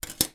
office ruler plasic place down on surface or wooden table